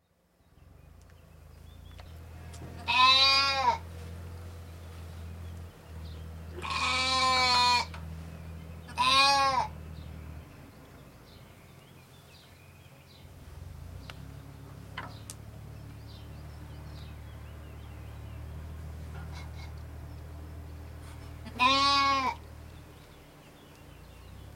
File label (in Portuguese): Cabritos e pássaros, binaural; Covas do Monte